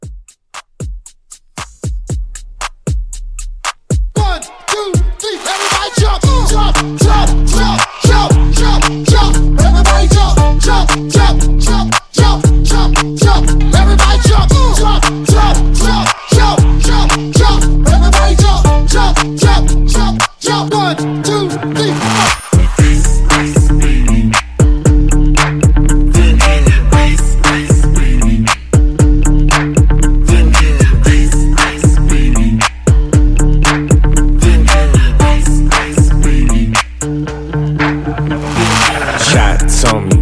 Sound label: Kool Hype Edit 116